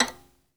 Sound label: SOLO VI, Synclavier Strings; ILIO